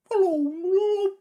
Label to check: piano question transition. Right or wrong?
Wrong